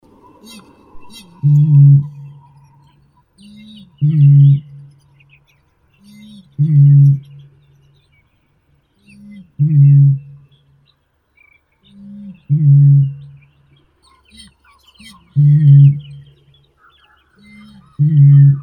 vip bolshaya botaurus stellaris

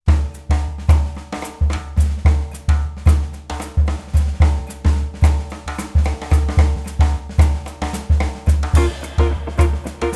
jingle bells lounge style

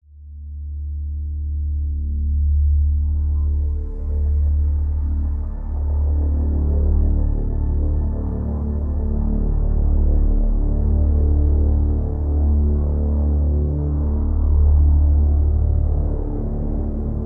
ambient

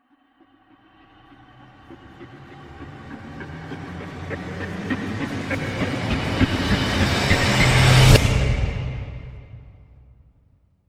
risers switchblade

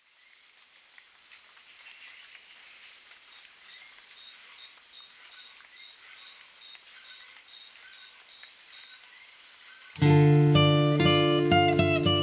evening ambience; for emotional healing

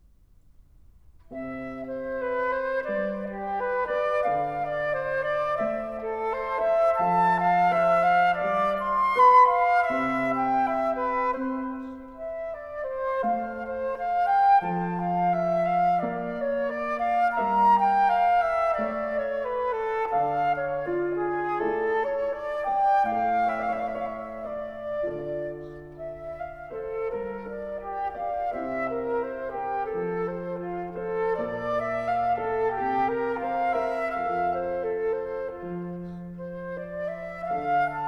flute sonata in c dur andante; bwv 1033 i

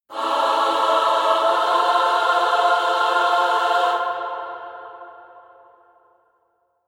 choir only

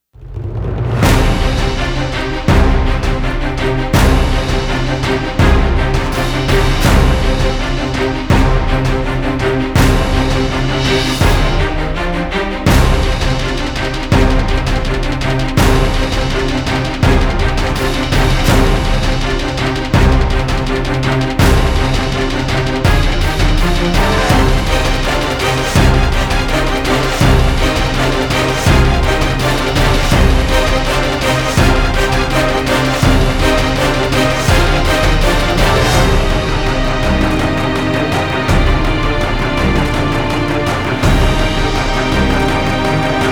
no choir

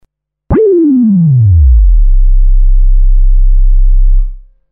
wild sweep

